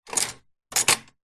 office slide projector vintage slide rack loader pull put push in voigtlande; 1960 voigtlander perkeo; zapsplat